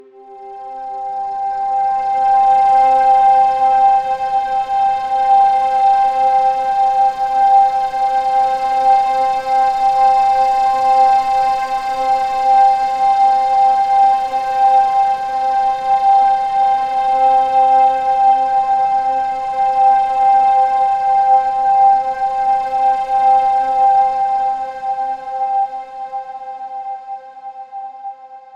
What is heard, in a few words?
ambient transitions